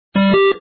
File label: sound Game Over; rescue lander